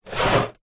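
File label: electronic typewriter slide on wood desk; office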